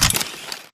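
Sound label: Tick Movement Footstep Jump Land; Titanfall 2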